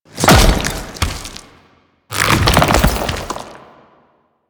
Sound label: Arm Entry; Warframe; Man In The Wall